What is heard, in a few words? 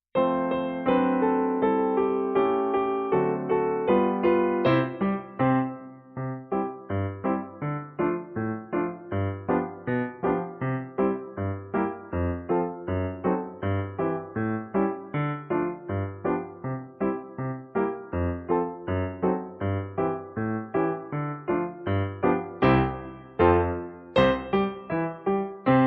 piano